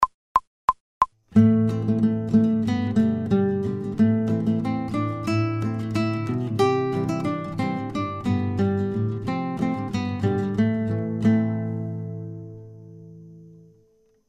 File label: Shady Grove fast without metronome, guitar